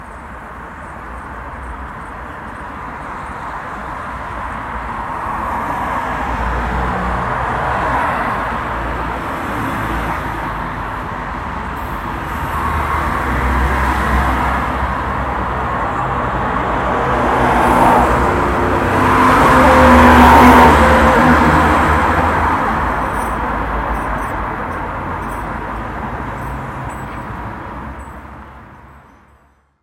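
night city, symphony of streets and crowds, Night city ambience, loopable, distant traffic, low-frequency rumble; 30s; Игровые сцены, фон для интерактивных сред, прототипы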